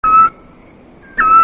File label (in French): Hibou Petit Duc; strigides; Oisellerie Galan